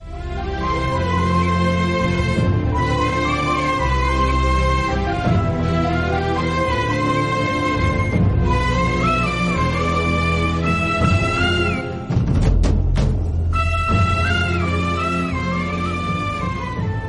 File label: background music